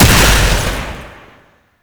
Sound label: Incarnon Wrist Gun Projectile Explosion, Weapons; Warframe; Tenno